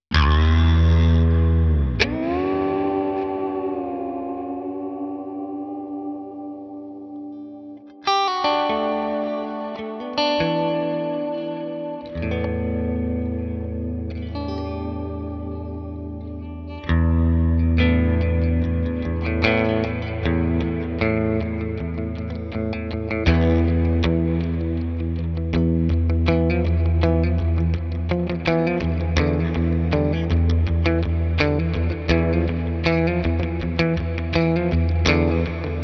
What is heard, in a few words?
Morgan Amps Clean-ish Ambient Solo PR15